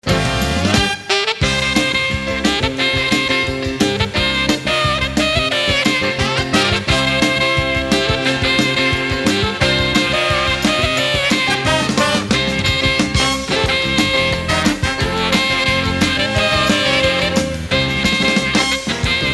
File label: instrumental; Steelcase